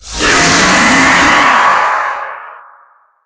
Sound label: spider striking